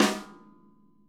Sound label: SNARE, Interactive Drum Kits